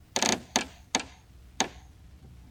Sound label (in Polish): otwarcie wieka trumny